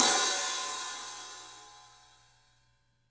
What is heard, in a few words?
Studio Hats, 3DPercussives